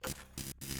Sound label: win display